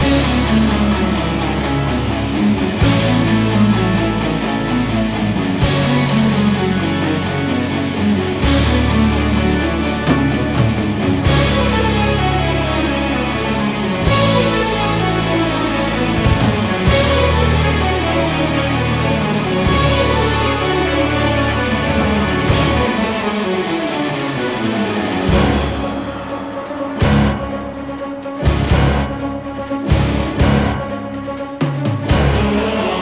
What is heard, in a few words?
action high loop; heroes of the storm full gamerip 2015; towers